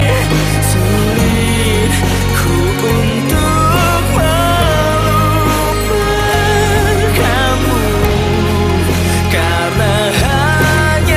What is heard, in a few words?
Jrock